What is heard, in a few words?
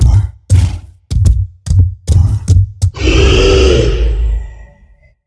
Rhino Enraged